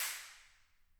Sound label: stair impulse; 階段1; プロジェクトA